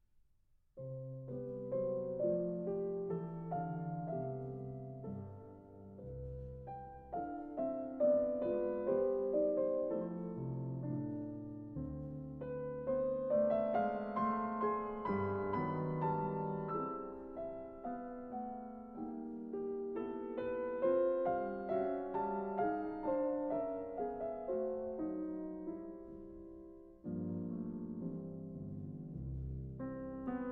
Piano Sonata in C Major Allegretto

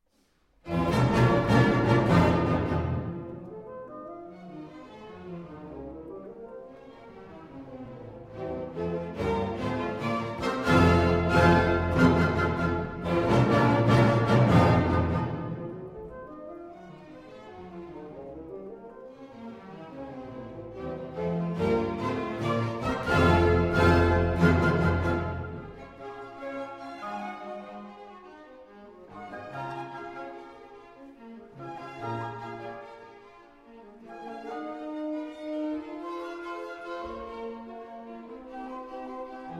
Symphony in B-Flat Major

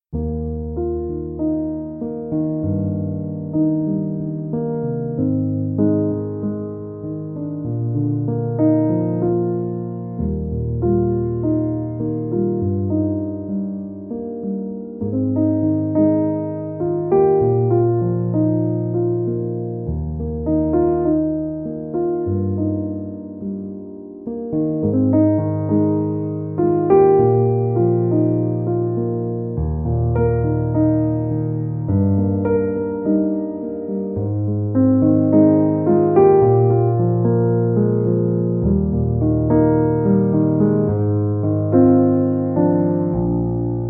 Instrumental Piano

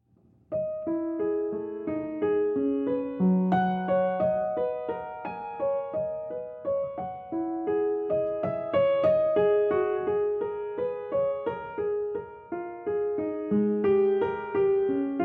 Improvisation

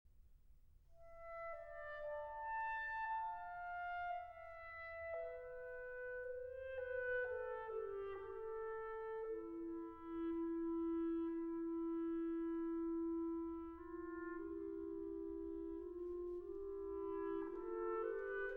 Piano Concerto in C Major, Andante - Allegro; Prokofiev; Piano Concerto No. 3; Op. 26 I.

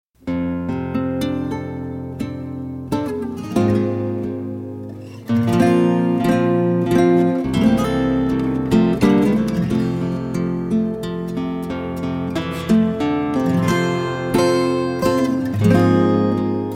Instrumental

